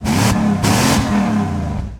Truck Dakota Rev; Trucks

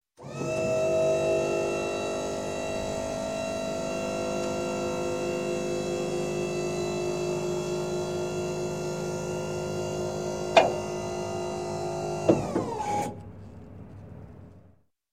Truck Power Tailgate Lifting; Sound Ideas 2000 Series

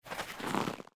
Ice Heaps; Blind Quest - The Frost Demon

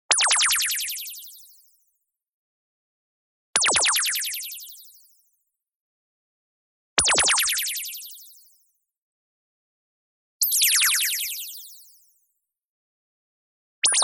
zap, tiny rise up; boom library; Anime Essentials

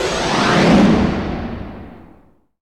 NOIS; XXL 800 Production Effects

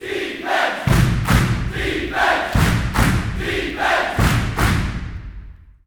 BBall Crowd Defense; Crowds

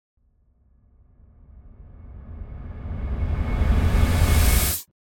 Metal Reversed Impact Sharp Metal - Longer; Hollywood Edge; sound designer's toolkit v2

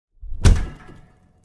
Big Fridge

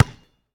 Street Ball Bounce Concrete; SFX Kit; Street Basketball

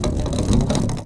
Golf Ball Roll Metal Pipe; Misc Sports

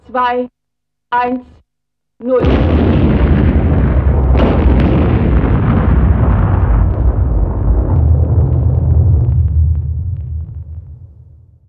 rocket space; best service Blue Box

